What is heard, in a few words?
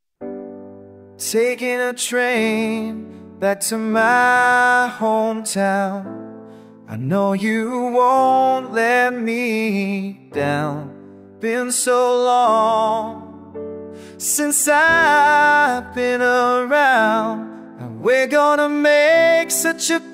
piano mix